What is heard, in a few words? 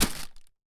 rustle; casualtiesunknown